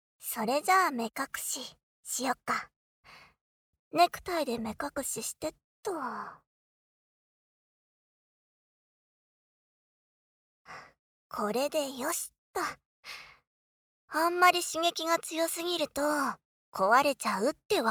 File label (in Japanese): 耳舐め＆淫語攻め Voice only; オナニーさせられ